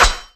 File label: key; Geschicklichkeit; Bricks Of Egypt